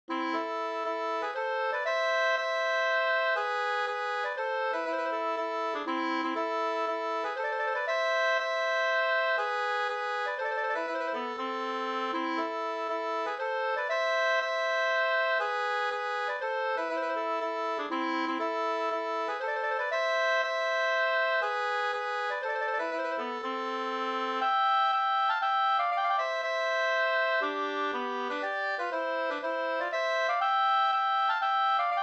polska Bodapolska; Furuboms polska 2st

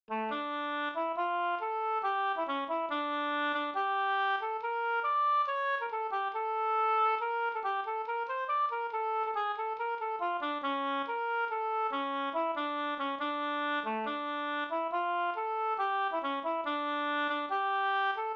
humppa

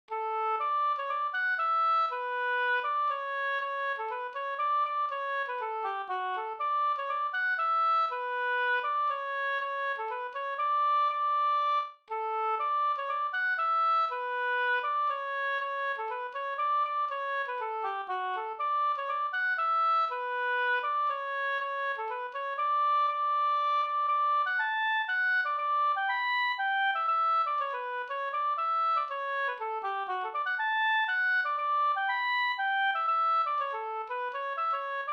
hamburska

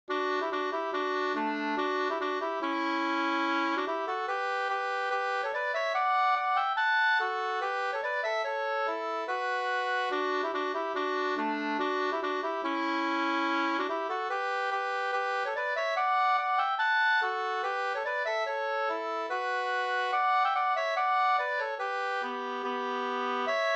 schottis; Luffarschottis av Karin Hjelm 2st

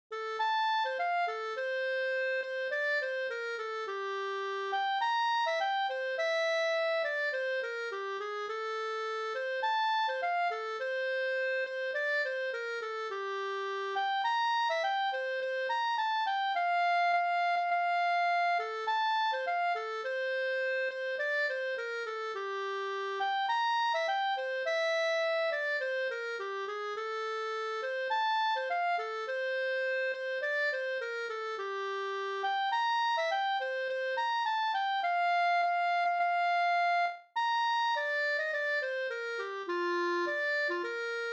brudmarsch; Sala

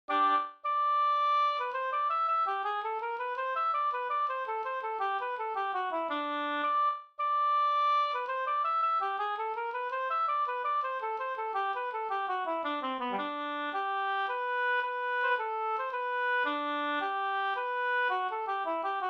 polska fr Fryksdalen; Vr Ahlstrom

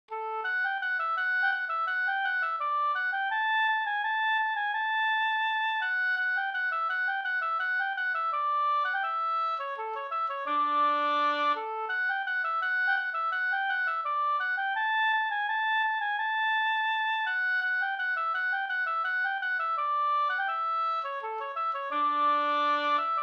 skanklat; Skoldinge skanklat

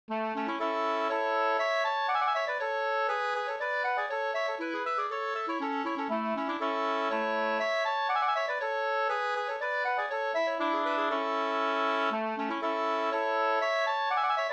polska Bingsjopolska; e Nylandspojkarna Nerifran 2st

